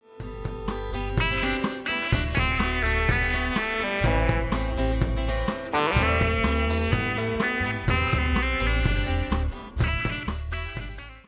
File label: altosax